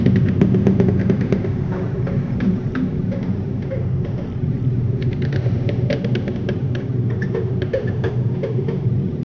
howlingf sea pirates humm, sea

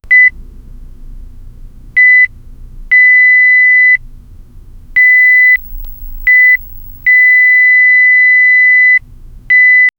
bike sound

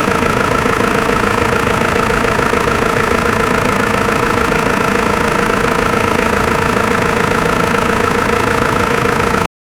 formula 1 engine, race car

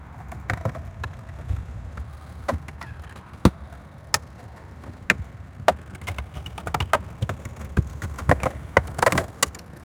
skateboard land wood ramp; skateboarding

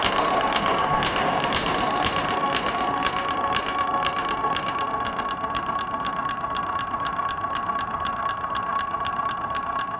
industrial click mechan, steampunk

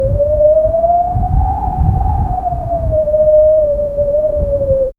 loud wind blowing; gale

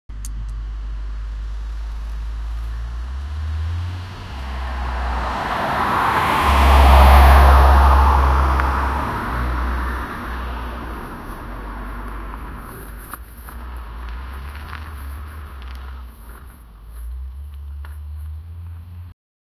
the sound of a car, departure

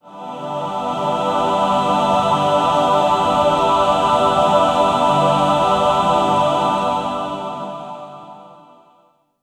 choir pad

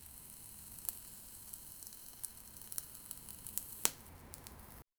the sound of butter being, culinary

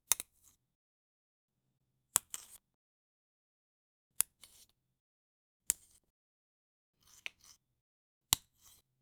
sound a gentle fondant, cake

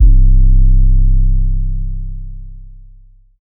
808 bass for drill music

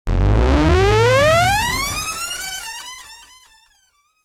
fail sound effect; gameplay

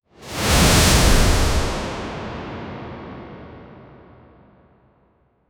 sound of curtain, shut

